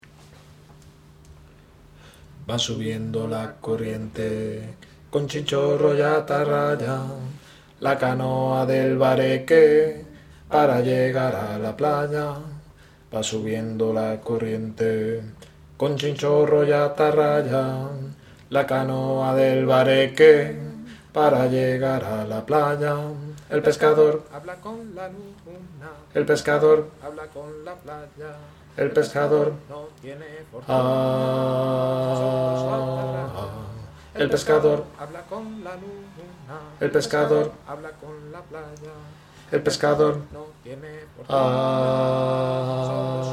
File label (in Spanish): el pescador tenor